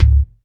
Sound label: DM5 Kick, Alesis DM5 drum machine